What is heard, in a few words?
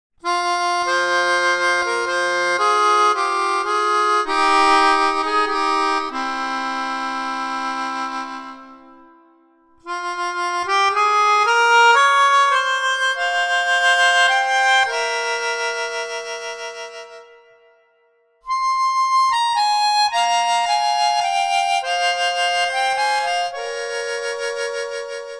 Bit of baroque